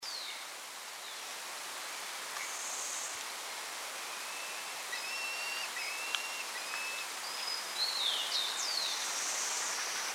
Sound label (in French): caracara du nord, chant